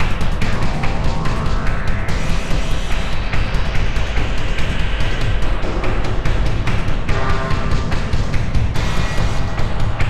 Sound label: Boss Theme; Insanely Twisted Shadow Planet